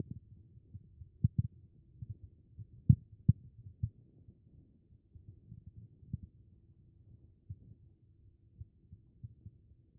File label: HEART B MODE